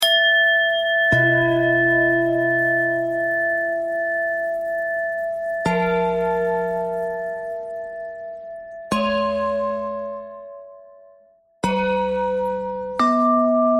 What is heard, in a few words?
bell thread bend thread